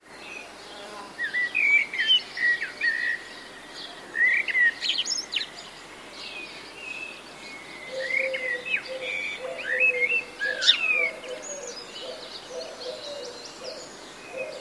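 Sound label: sounds of birds singing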